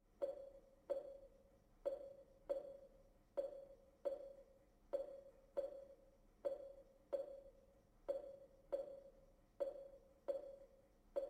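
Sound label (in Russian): Осциллограф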